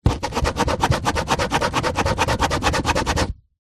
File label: office pen ball point scribble on paper on wood table; zapsplat